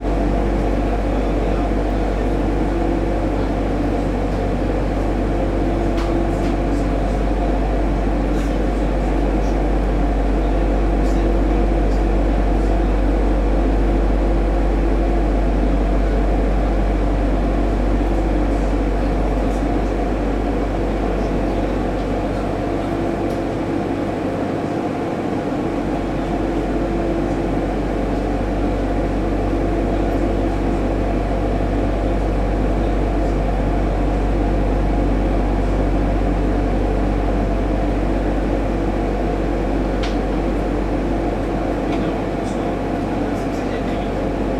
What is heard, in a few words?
transport train channel tunnel uk car shuttle internal idles people in background